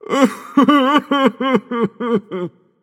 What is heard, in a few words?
boohoo; smite; poseidon